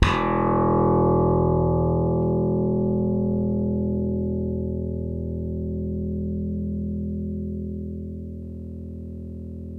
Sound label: SLAP BASS, Electric Basses